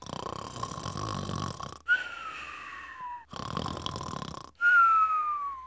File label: snore; Wired for Sound Pro CD; Aristosoft, Inc.; homer